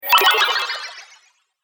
Rpg Spell Summon Magic; fiction sound effects

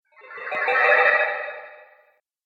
Spell Water Illusion, Magic Sound Effects

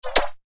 Whip The Laser

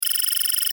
Text Readout Digital; Multimedia; Blastwave

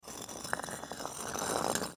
Soap Dispenser, Soap Dispenser Ceramic Slide; Household; Bathroom

